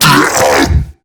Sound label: Hand Bomber Pain; Warframe; MiTW; Battle Barks